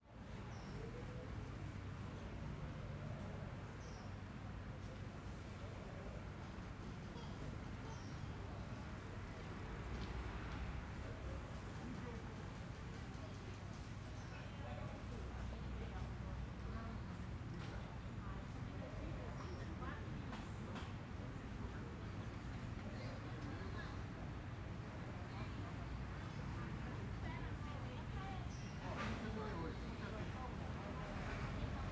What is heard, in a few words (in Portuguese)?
Ambiente na praca de residencial tranquilo dia vozerio longe; cidade